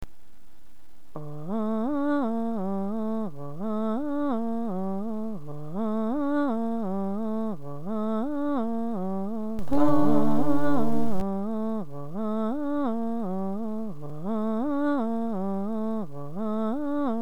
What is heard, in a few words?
A Cappella; ff7